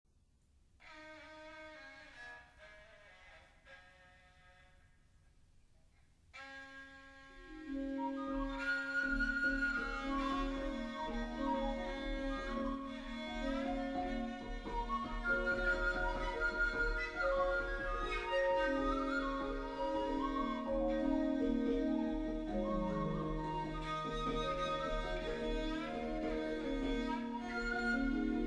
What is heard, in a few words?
Javanese Gamelan; Patalon; Indonesian